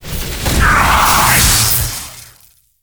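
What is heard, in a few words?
Mech Scorpion attack; monster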